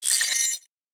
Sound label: atk iris mag